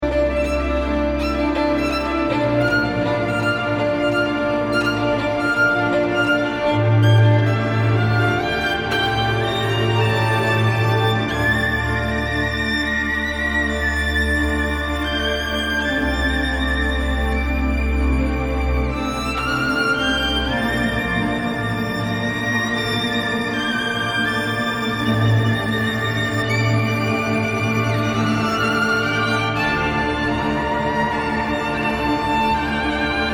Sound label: Violin Concerto excerpt